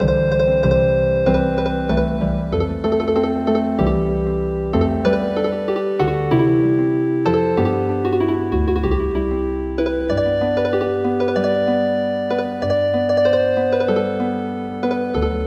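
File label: Healing Harp, traditional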